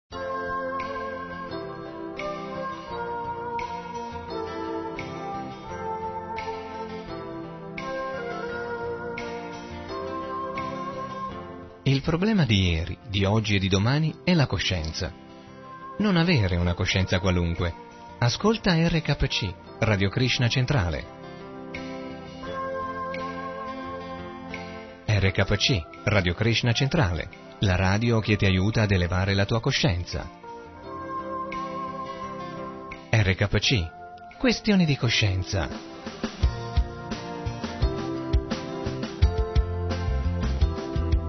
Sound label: Jingles; Questione di coscienza; RKC; Italiano